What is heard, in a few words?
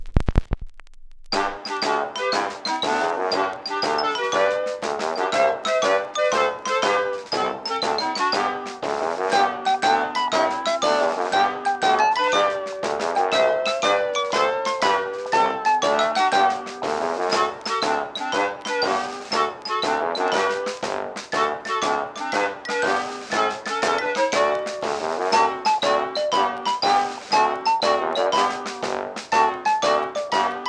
Large March; Jackbox Games; The Jackbox Party Pack 3